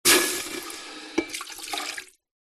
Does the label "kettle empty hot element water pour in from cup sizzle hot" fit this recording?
Yes